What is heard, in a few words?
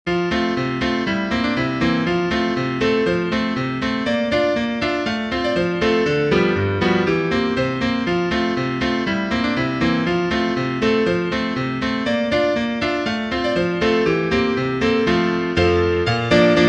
PIANO